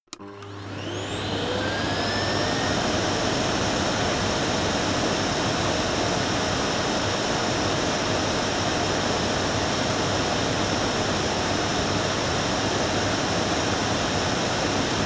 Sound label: vacuum high